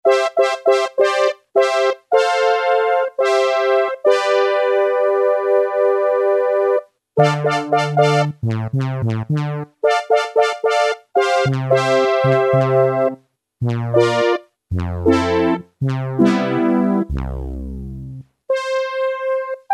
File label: BRASS ENS, Casio CZ-1000; Preset